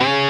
bend full

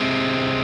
Arp. G#

